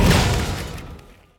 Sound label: explosion; Phoenix